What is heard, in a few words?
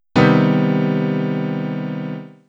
C minor major 7 chord